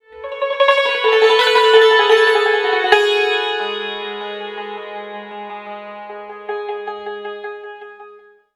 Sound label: suntur, stringed instruments